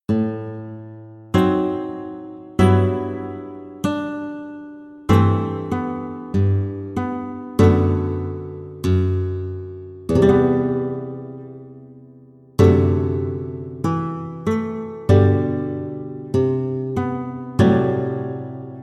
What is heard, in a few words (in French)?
etudes harmonie G